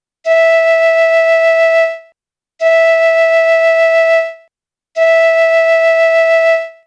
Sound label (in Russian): 1 oktava 3 mi flejta